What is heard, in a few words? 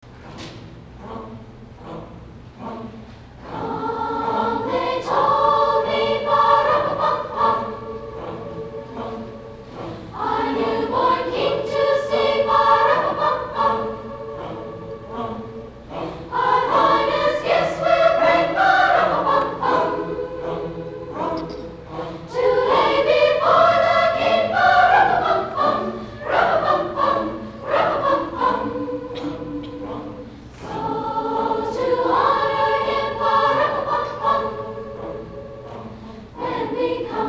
Carol Drums